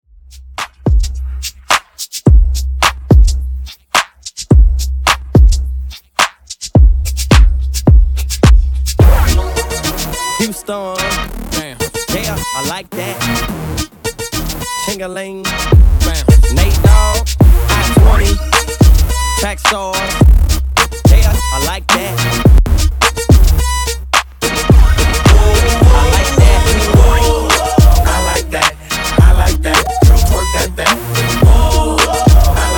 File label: Redrum 107BPm